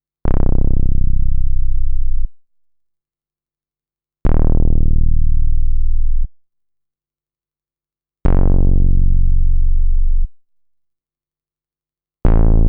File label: SQ Bass, synthesizer